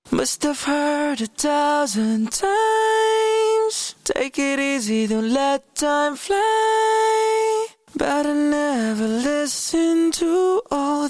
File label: pop 123 DM